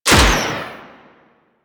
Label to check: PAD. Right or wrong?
Wrong